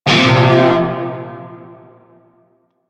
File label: Shawzin Hirajoshi Chord K; Music; Warframe; Narmer Shawzin